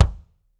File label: BD, Drums; Bob Clearmountain Drums I